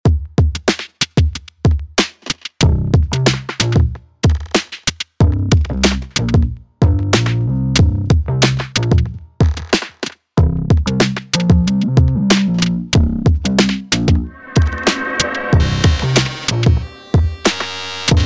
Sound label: dark technoid groove